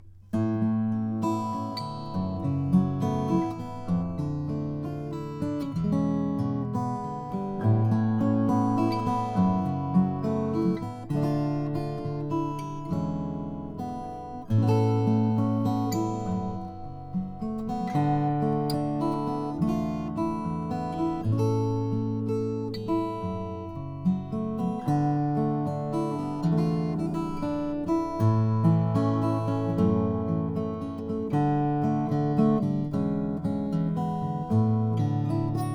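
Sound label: froggy bottom h12 limited all 5a koa, guitars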